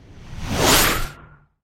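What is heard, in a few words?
acceleration sound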